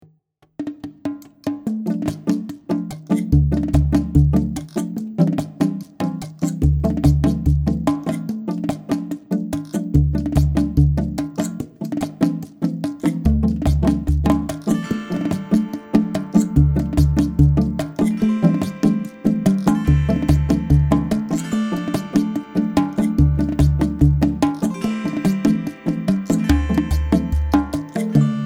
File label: bongo bongo